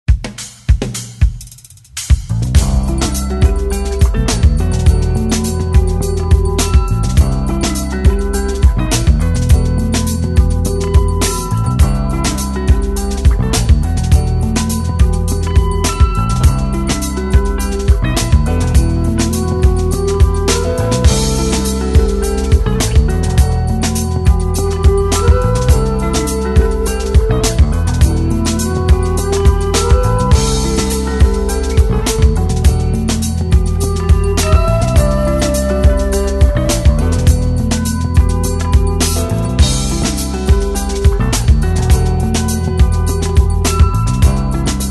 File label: groove